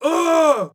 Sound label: flinch hard; Rust